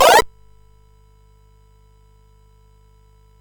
Dragonworld sid CSG8580R5

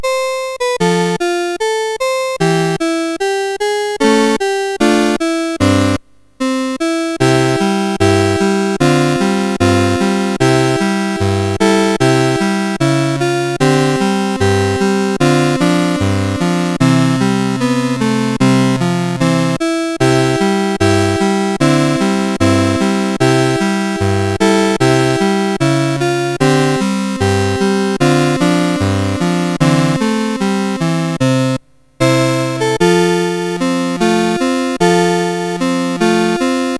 Marines Hymn, MOS6581R4

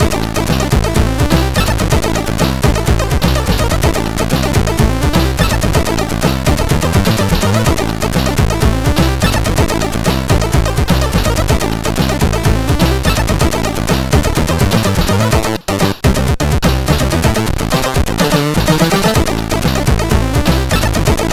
Fade In Intro, CSG8580R5

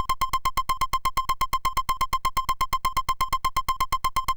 Shuffle, sid MOS6581R3

